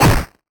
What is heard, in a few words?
Hit 8 Bit